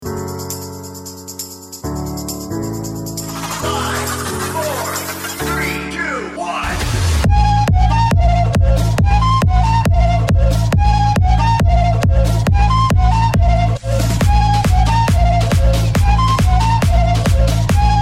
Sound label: disco 137 bpm; Clipdance disco children